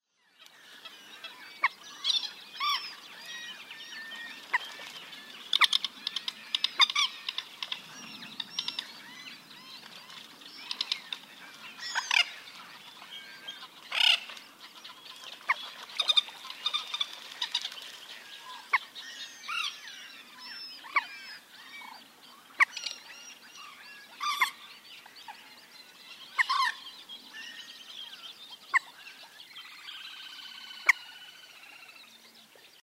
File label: moorhen